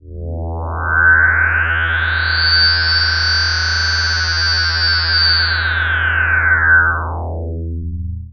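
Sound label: synth, Production Effects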